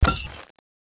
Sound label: water knife draw